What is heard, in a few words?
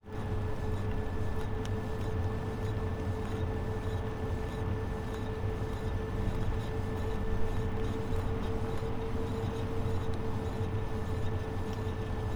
pass By backwards tires, EV3 Tires Only, electric vehicle; model Y